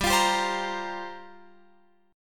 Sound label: G Minor 11th G down Guitar Open F; Gm11 strummed